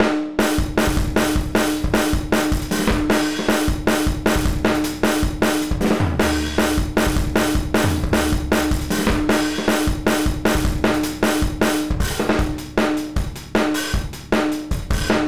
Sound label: GARAGE ROCK DRUMS MONAURAL